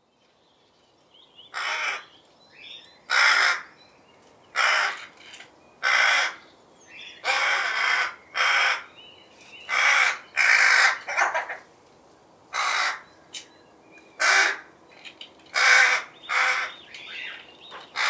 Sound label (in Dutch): roek